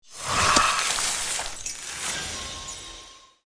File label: Ice magic spell freezing; fantasy